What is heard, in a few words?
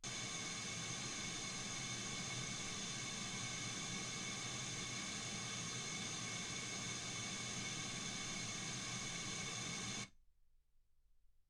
wgn 48kHz